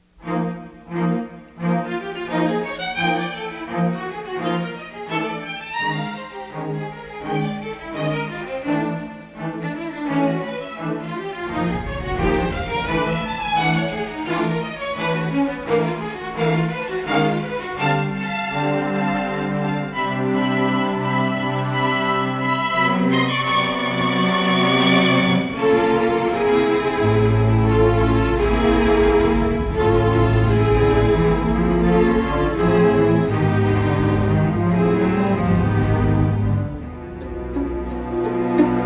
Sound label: Laser Bay Music; Tron